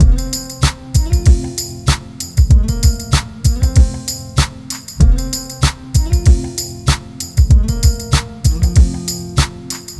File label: full loop, 4bar 96